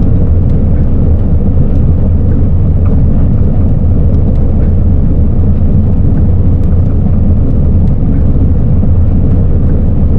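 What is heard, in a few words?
plane move cockpit, aircraft; DCS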